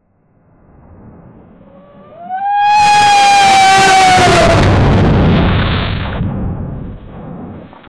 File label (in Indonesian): suara teriakan, reverse